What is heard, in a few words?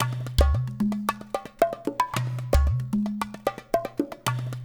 LOOP 4 L, Percussion; InVision Interactive; GRUNT